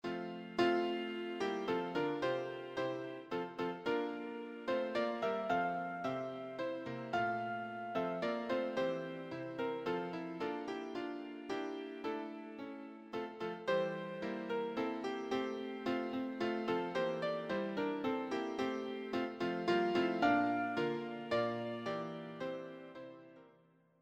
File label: audio sample piano